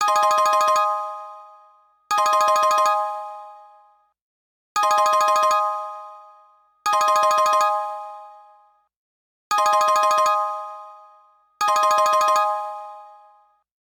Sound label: Telephone Ring